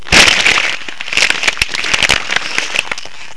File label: crash to ground; Macworld (1998-10); Games World; Shareware Games; Arcade; idin 2.0